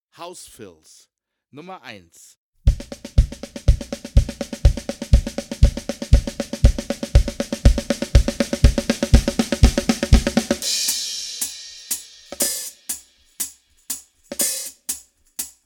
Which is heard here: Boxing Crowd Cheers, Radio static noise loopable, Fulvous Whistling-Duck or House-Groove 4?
House-Groove 4